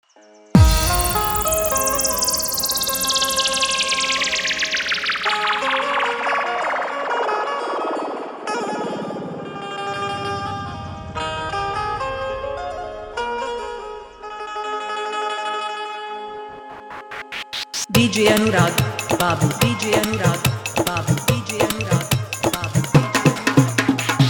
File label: Bhojpuri Hit Dhollki Mix; Dj Anurag Babu Marikpur Jaunpur